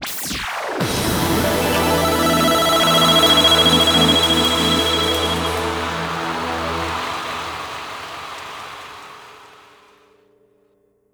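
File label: Battle Opening, jingle; Splatfest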